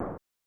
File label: Hit; Kabuki-chou Reach Mahjong Tonpuu Sen; SNES